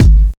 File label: original kick drum g sharp key